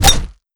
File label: golf club hit; tf2